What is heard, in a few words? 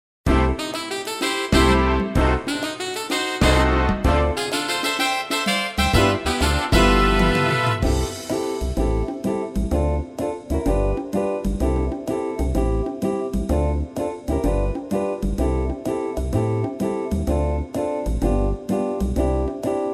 Keyboard jogging, piano; pistas-piano